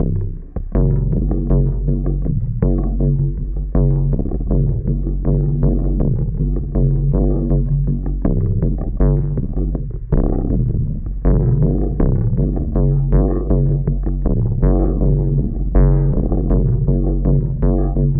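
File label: flanging arpeggio; Zero G; Malice In Wonderland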